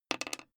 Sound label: die roll table short